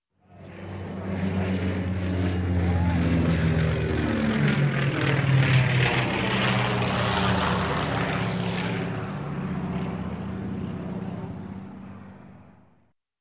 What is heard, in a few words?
Twin Prop Grumman CS2F Tracker Pass By; Sound Ideas; Series 2000